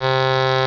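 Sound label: Crumhorn; Instrmnt; Sound Library 2000; Wayzata Technology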